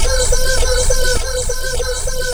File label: VOX LOOP, Anime Sound FX, LOOPS